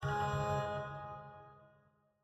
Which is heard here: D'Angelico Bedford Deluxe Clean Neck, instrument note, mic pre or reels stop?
instrument note